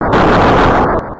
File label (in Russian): pozhar shumnii blizkii korotkii aktivnii